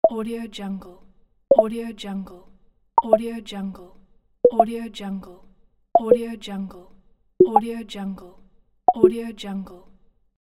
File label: message pop